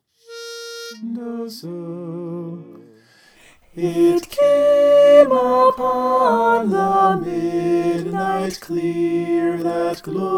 soprano

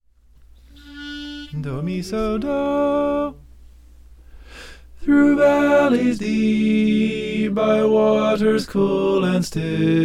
trusting the shepherd tenor

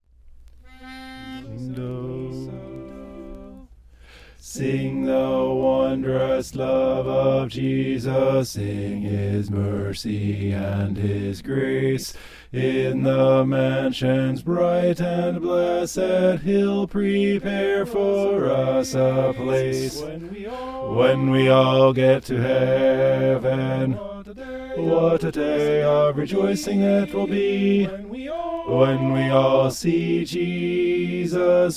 sing the wondrous love bass

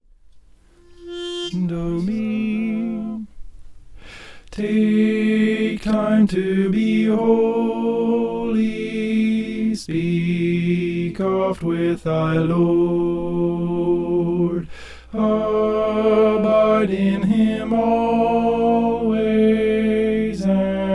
take time to be soprano

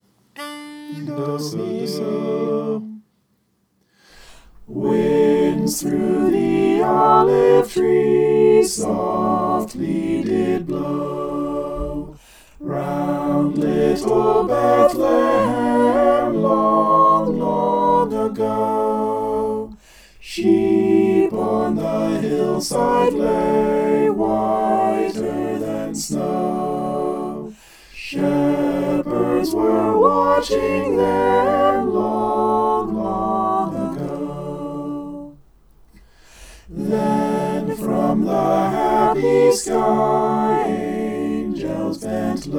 mixed

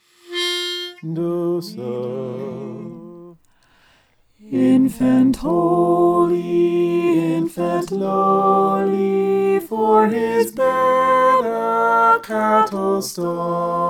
soprano

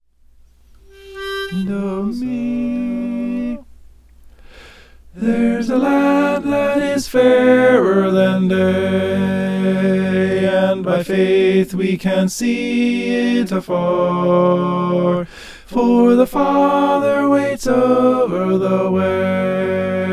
tenor

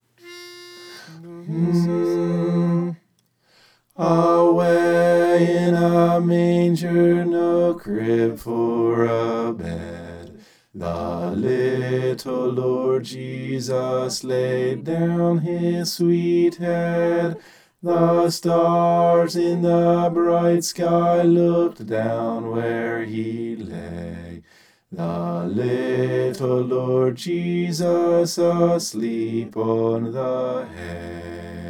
bass

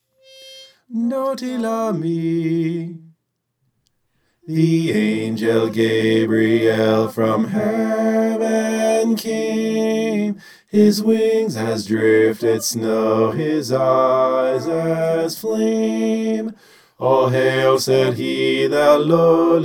the angel gabriel bass

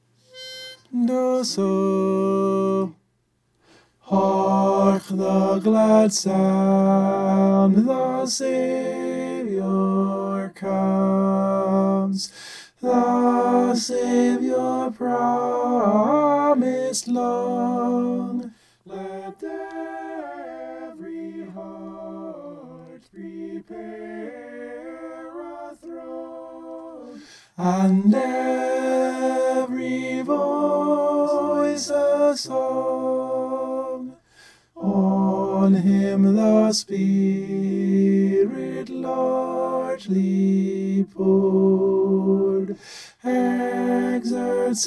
hark the glad sound tenor

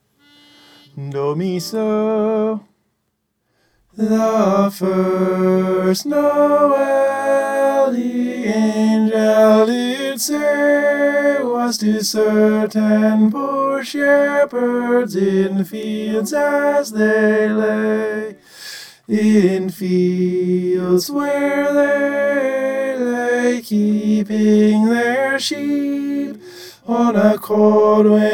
tenor

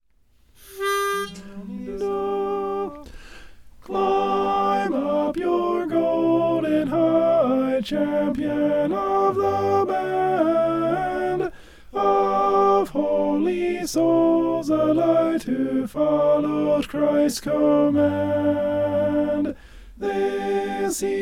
alto

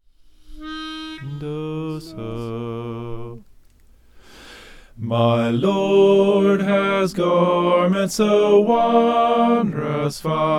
soprano

